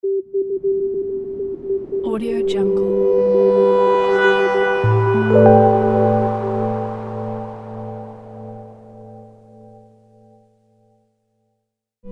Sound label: gentle minimal logo